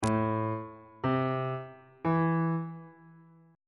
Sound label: f major chord 3 is a minor